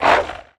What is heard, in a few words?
tiger attack; mir2; crystal